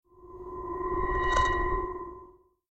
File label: Scary Alien Whoosh; SoundsCrate